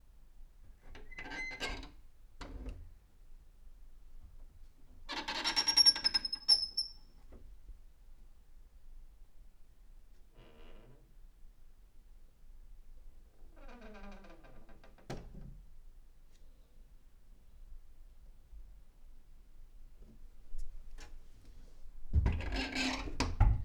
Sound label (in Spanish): Puerta Rechinando Creeking Door